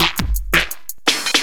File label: LOOP SD, 168 BPM, Drum & Bass